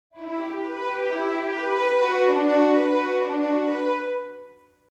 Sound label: Violin Section Arpeggiator; NOVO